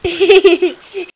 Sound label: laugh